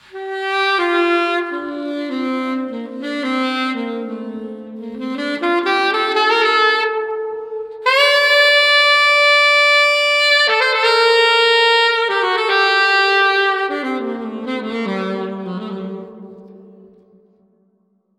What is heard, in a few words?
Saxophone; Monacor